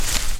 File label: leaves